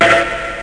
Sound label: ghost, chords